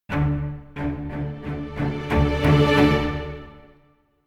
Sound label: Combat Out; Skellige